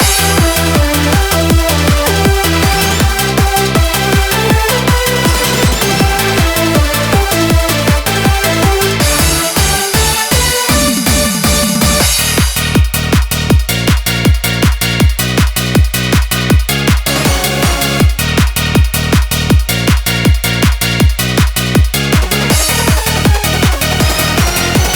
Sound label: instrumental